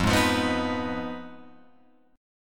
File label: F Minor 7th Flat 5th, Guitar, Open F; strummed; JGuitar